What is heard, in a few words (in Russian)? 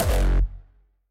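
звук ноты фа диез в нижней октаве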